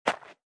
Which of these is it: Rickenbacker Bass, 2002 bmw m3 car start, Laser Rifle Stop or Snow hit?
Snow hit